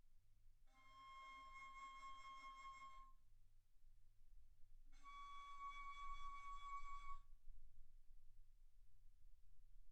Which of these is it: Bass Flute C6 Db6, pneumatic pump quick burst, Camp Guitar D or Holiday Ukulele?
Bass Flute C6 Db6